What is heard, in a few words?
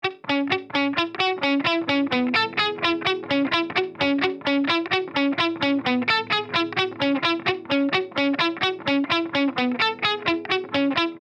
no deley